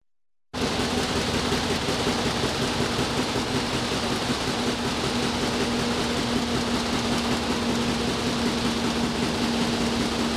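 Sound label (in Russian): производство типография работа печатного станка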